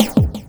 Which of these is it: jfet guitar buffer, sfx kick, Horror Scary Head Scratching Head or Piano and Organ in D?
sfx kick